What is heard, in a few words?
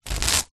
household hand fan fold